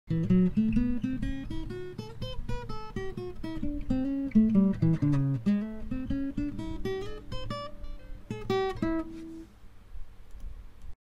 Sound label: Blues Riff